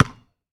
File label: Street Ball Bounce Asphalt; SFX Kit